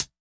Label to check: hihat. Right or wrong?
Right